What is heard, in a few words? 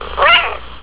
meow